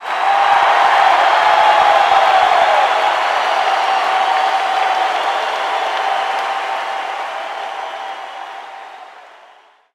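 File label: Basketball Crowd Large Cheer; Crowds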